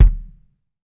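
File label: bass drum, Drums Acoustic; AKAI; 90s sample CD; USB Soundscan vol.10; RAW KIT 2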